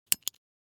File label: Menu button click